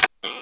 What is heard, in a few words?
Item Chest Open